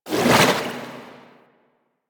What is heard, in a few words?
Water Tomb Cast; Warframe; Yareli